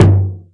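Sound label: Boss DR-202 percussion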